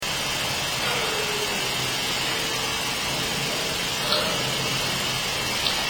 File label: Weird Howl